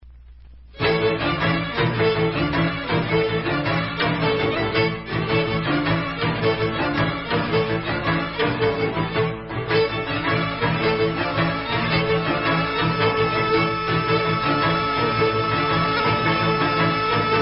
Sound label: Cimpoi, Instrumental